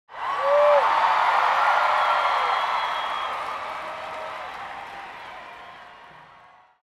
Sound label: cheer